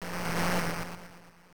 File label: Time Alert; Frogger; msx